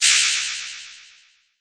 Processed Ethnic Percussion; Doru Malaia Percussion

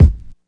classic hip hop Raw kick; STYLE KITS (Hip Hop, Etc); Classic Hip Hop Mega Kit

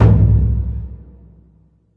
big burundi, African Burundi Drums

